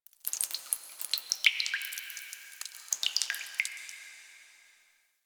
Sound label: Ocean Cave Drips; Warframe; Grineer Ocean